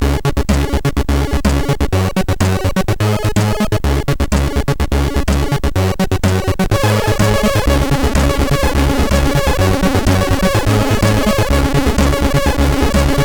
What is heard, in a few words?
Funny sid MOS6581R4; hvsc